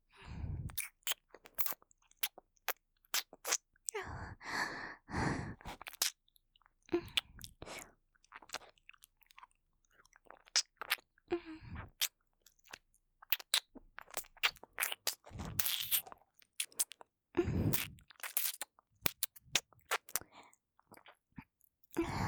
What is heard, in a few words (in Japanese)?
耳舐めオンリー 左耳, ASMR